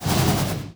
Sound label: Cyclone Slash Short